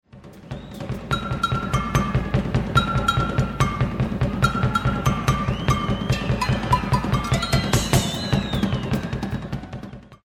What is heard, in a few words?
cowbell